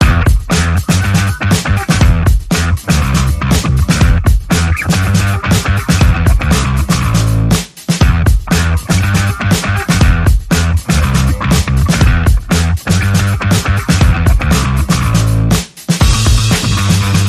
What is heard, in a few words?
Percussion Bass and Effects